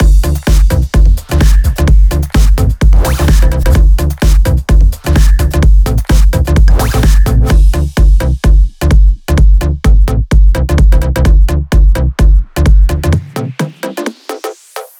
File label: Mix, 128BPM; Vengeance Samples; Mars Kit